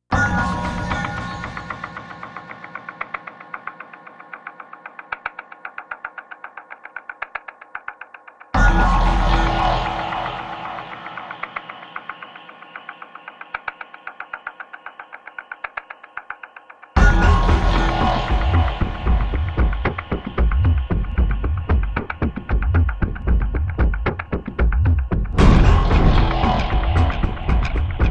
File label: Grinding Gear